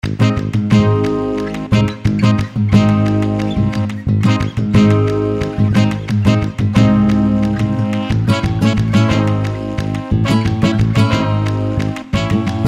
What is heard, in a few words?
FLAMENCO DAY